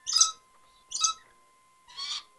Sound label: bird chirp red lories